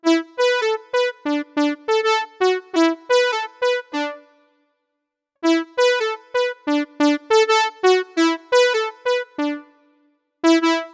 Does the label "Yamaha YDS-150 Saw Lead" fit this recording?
Yes